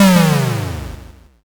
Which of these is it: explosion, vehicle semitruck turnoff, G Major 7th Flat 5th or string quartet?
explosion